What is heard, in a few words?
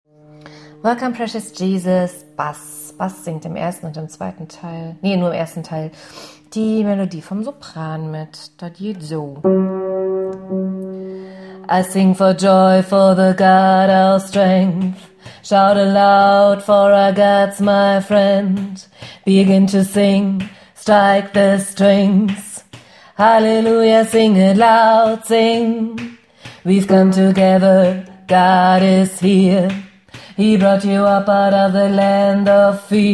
Welcome precious jesus bass, gospelchor